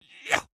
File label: Wind Up; Joblin; Going Under